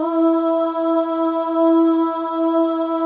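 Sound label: OH, female OHS, Voices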